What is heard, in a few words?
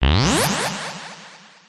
synth space weird synthy sci fi rise accent; Jessey Drake